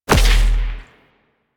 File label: Edge Kick; Warframe; Warframes; Pacifist